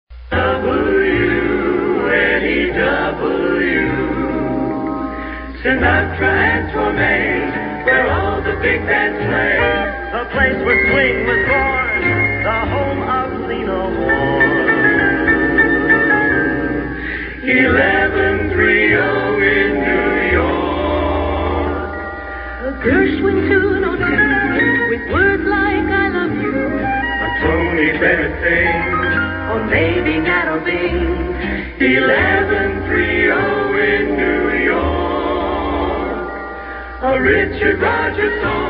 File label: wnew jingle